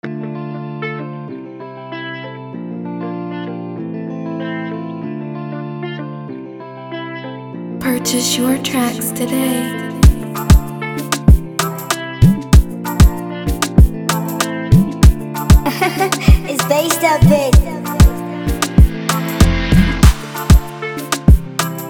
Freebeat 96bpm F major, Afro Type Beat

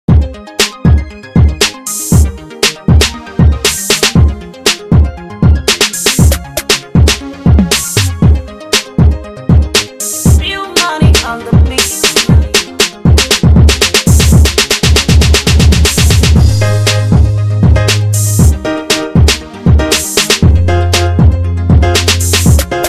BEAT

